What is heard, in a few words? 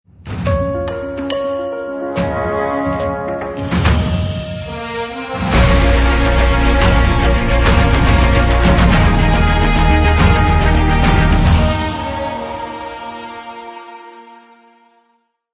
Inspiring Trailer